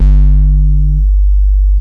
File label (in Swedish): ganska fet, phat bass; Giga Samples Collection; cx5